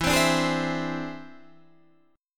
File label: E Augmented 7th E down Guitar Half Step Down